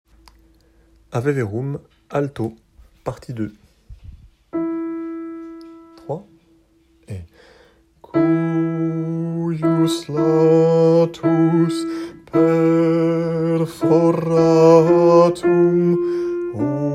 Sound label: Ave verum alto; Mozart; Ave verum P2